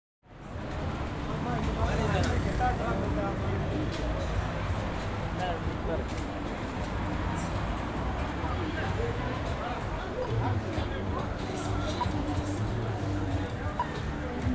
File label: Little India Street Signature, Singapore; Les Cartes Postales Sonores; NOISE ON EARTH Vol4